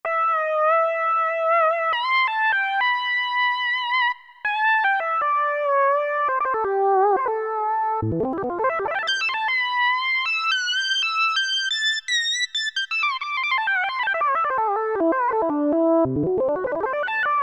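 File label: lead Medium; E-mu Proteus FX; Factory Presets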